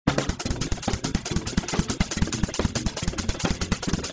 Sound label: Jazz